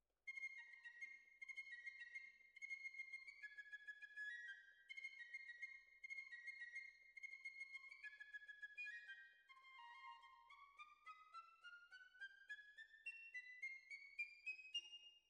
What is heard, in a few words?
Violas, jazz for piano